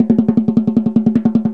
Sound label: Running Drum; psion cd vol1